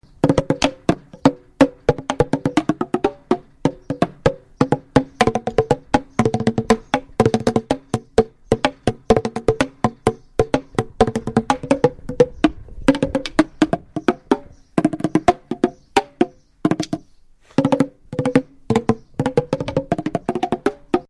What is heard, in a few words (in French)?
pan